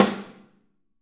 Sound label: Snare OH; Drums Percussion